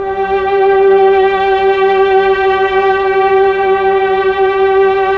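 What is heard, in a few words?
Pad Tron Violin & Choir G4, Slow Str+Vox; Keyboards of The 60's and 70's; Melo. Str+Vox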